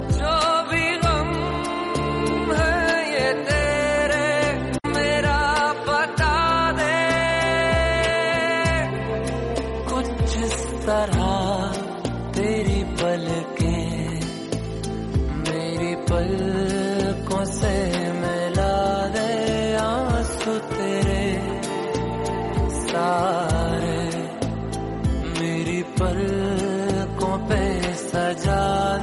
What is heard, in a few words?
sad ringtone